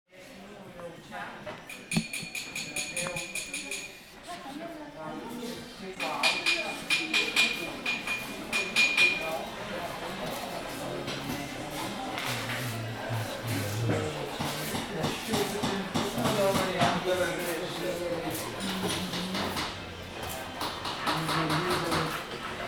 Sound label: Marrakech Jewelry Handcraft Artisans; zoom h2